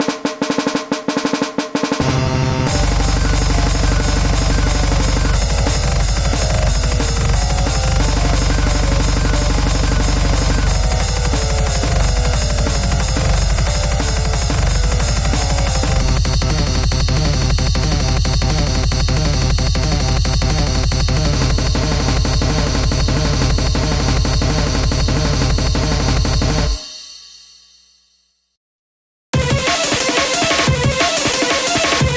Instrumental

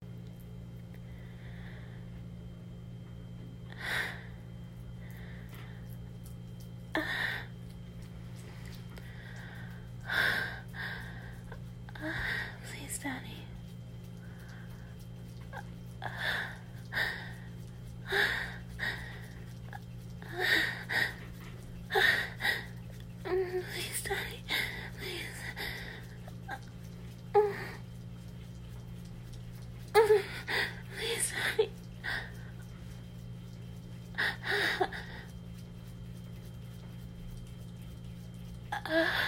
F4A Moans Breathy Needy; Please, Daddy; [Teaser]; [Wet and ready]

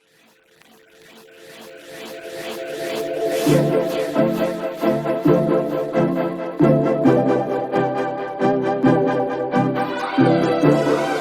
raindrops instrumental; Pony Music Archive

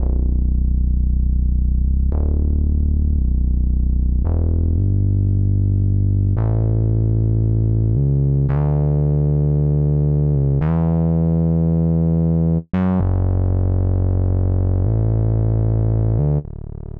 bass, 113BPM; Pony Fan Music Remix Stems

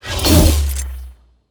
Monster; Zenless Zone Zero

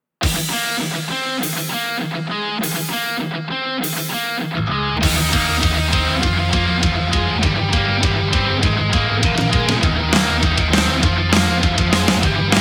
[Instrumental]

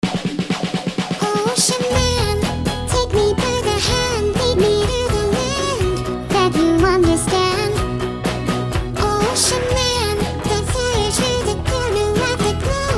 Singing; Neuro-sama; Virtual YouTubers

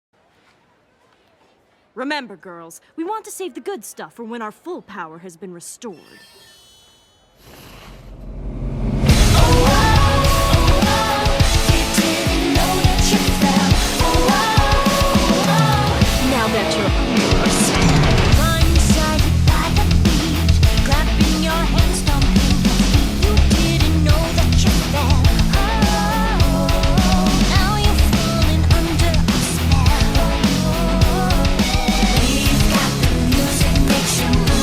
Metal Accompaniment; Pony Music Archive 19.03

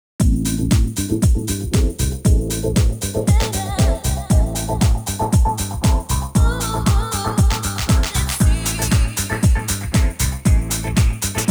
Jazzy Groove; Three Day Weekend; DDR Edit

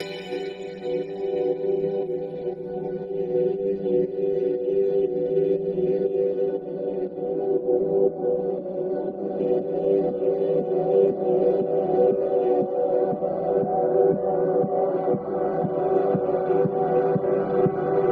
CICADA; Pony Music Archive; Year Of The Deer

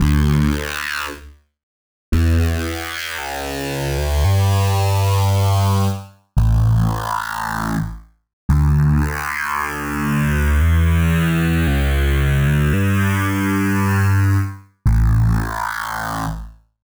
weird bass DRY, 113BPM; Pony Fan Music Remix Stems

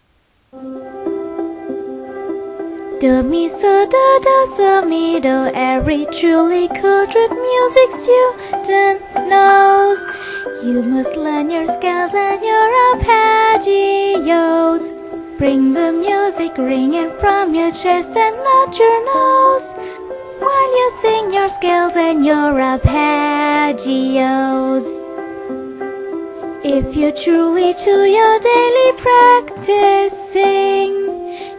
voice scales and arpeggios

